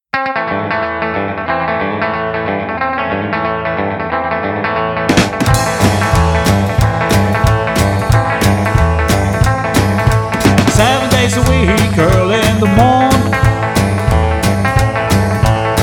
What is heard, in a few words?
Rockabilly Version